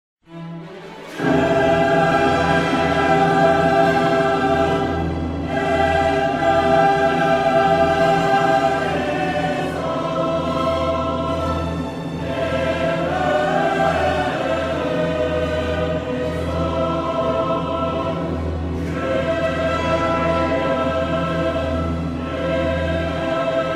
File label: messe kyrie